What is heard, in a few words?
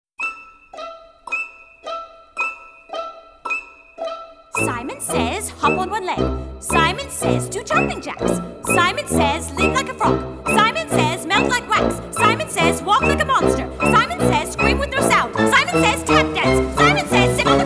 Guided Vocal